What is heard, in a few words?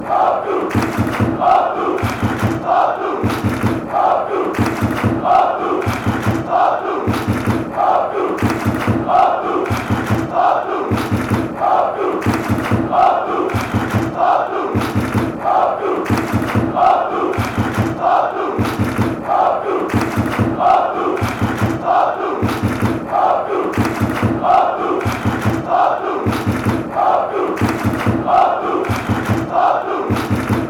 oh, fanchants